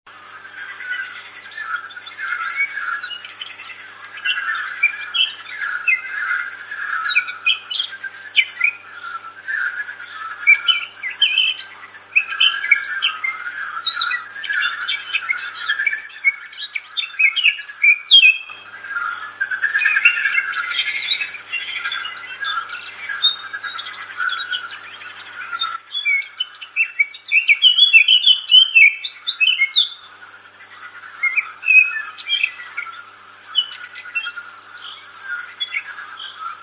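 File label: blackcap, frogs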